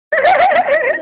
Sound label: Motor Laugh